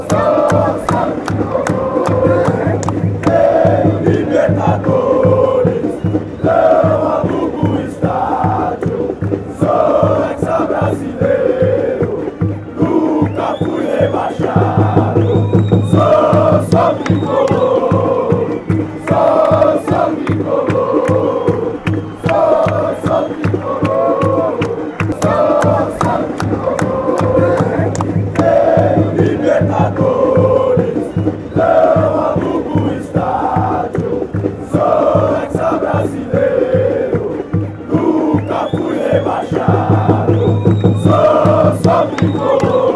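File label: sao paulo chant, football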